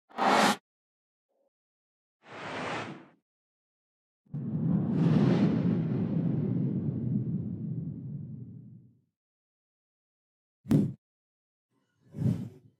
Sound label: Swishes And Swooshes